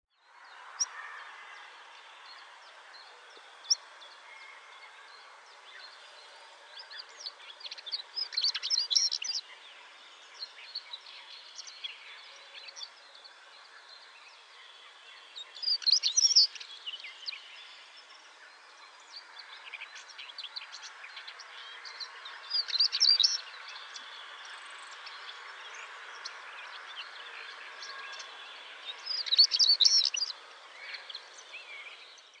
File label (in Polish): KLASKAWKA, birdsounds